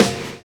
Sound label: WHISTLER; Univers Sons